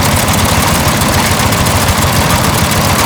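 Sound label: Settlement Drill Machine Loop; Ambience; Warframe; Grineer Settlement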